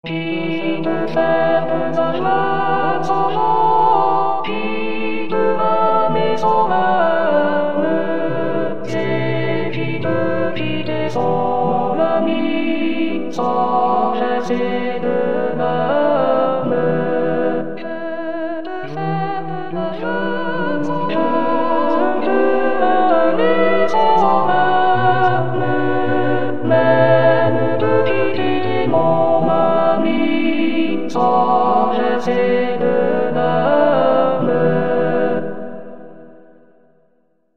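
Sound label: alto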